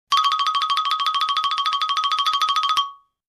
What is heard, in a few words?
Funny xylophone cartoon running sound effect variant